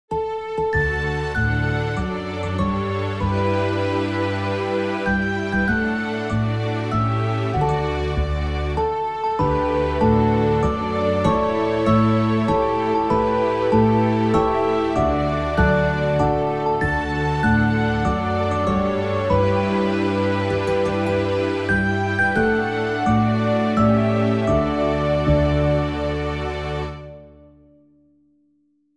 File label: happy